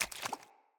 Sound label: Walk Water, Footsteps; Characters; Enemy; Mockeries; Mockery